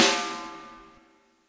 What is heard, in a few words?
latin hit snare; flstudio